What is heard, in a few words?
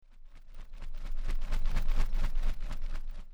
birds wings